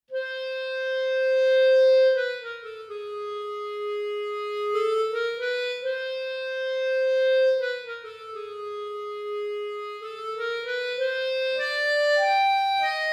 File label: woodwind clarinet solo